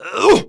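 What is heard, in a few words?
Prisoner Attack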